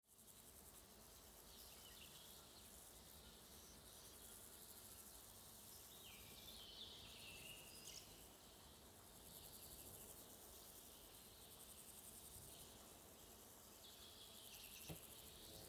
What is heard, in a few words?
forest morning june